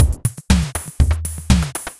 electro 120

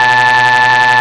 shrink ray

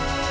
Windows Start

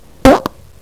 fart

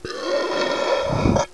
burp

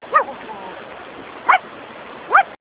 woof

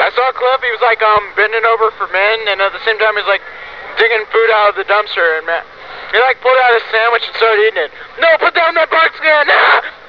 bend

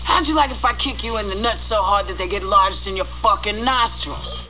nostrils

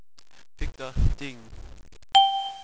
ding

